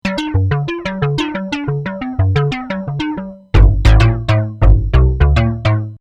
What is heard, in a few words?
crossmod; moogulator